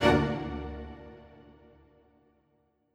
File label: Strings Hit Spiccato